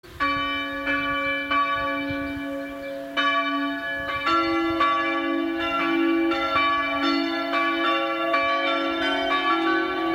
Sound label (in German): Kirchenglocken Presberg; Heilig Kreuz Rheingau